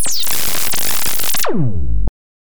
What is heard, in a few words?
tuning